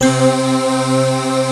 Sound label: MULTI SYNTH